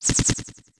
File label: bullets; snifit; sm64